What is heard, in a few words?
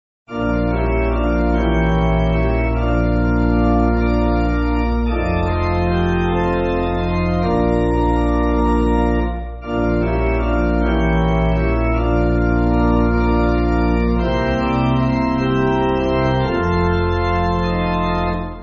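Pipe Organ, church music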